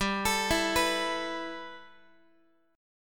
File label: G 6th Add 9th, Guitar; G6add9 arpeggiated; x,15,12,14,15,x